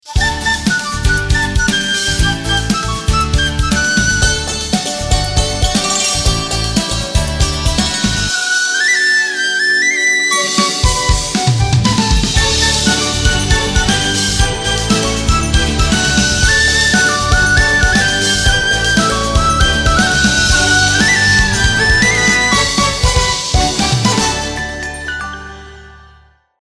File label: ringtone fresh mood